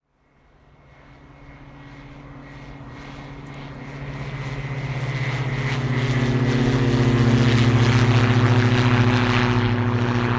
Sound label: transport aircraft airplane light prop pass overhead take off